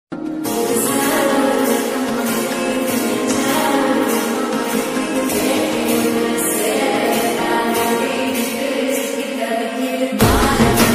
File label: tamil ringtone; Enemy